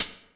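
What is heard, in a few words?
HIHAT closed; Crypton; DrumBase5000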